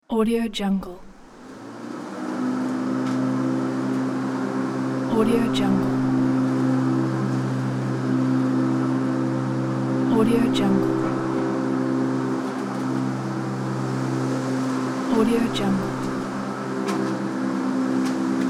uk garden lawn mowing

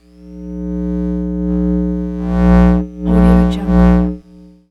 lost transmissions tonal passbys; soundmorph

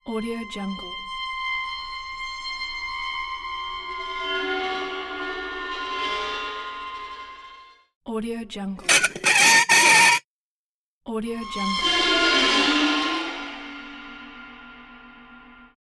scary scratch

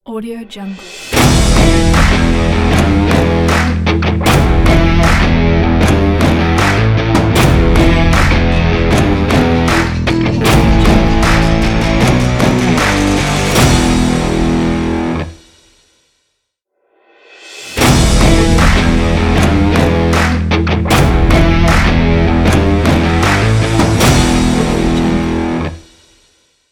rock logo

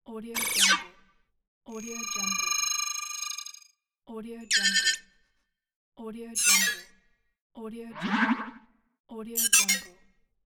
scifi interface

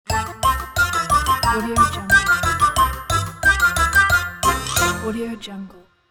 cartoon classic comical musical conclusion

